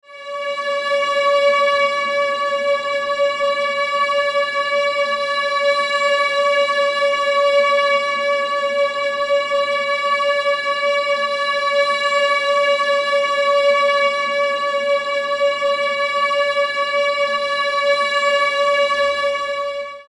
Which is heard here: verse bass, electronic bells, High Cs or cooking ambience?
High Cs